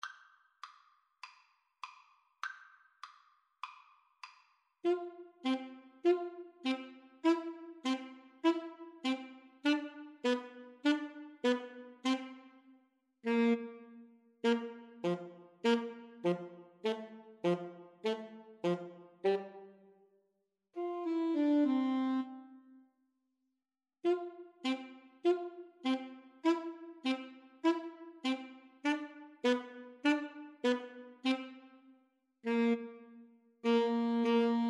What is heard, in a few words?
rag flute sax, Flute Saxophone Duet